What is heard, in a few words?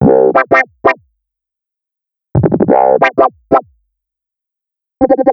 Clav Wah; keys